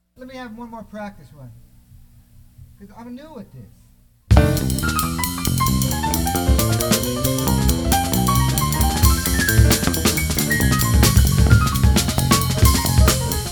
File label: Fast Piano Jazz